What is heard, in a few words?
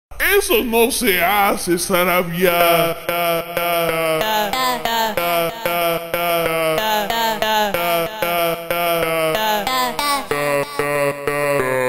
funk slowed instrumental